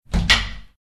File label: Locker Open; Decay: The Mare